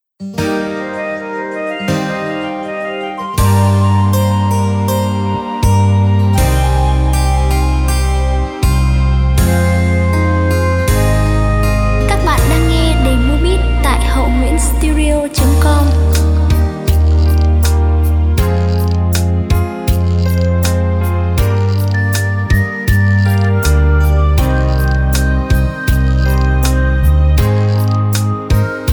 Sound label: beat, playback